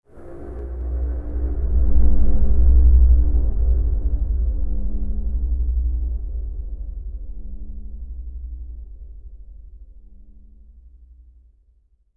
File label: Tense Stinger